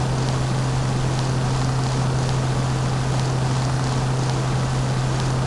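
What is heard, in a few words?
car drive, chicago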